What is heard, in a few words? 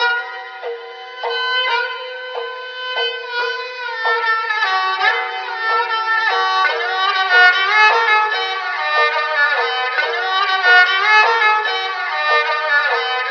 strings; Phonky